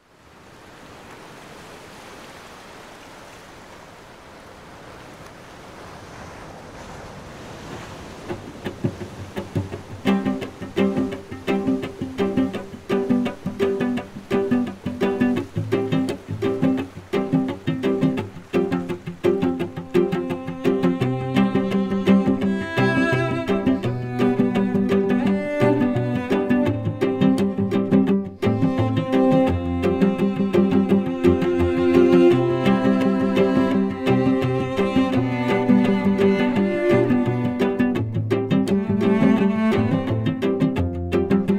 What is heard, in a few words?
simple gifts piano cello cover